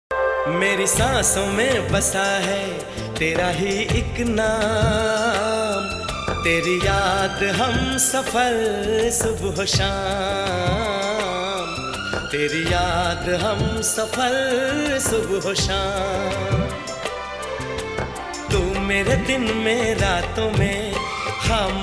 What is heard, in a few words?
Male Version Ringtone